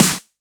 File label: Snares; Vengeance - Essential Clubsounds Vol.4